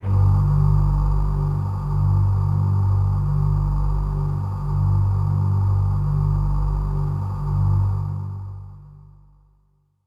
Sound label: Voices Kawaii G0